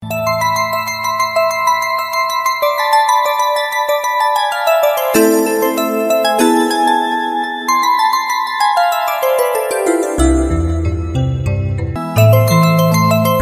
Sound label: BGM; Kadhalar Dhinam